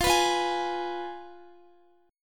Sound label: F Diminished Guitar; strummed